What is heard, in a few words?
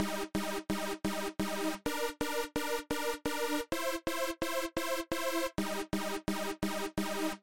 Lead simple